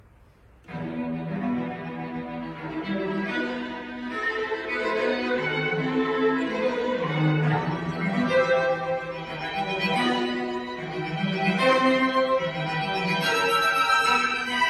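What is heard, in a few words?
string quartet e minor; mayer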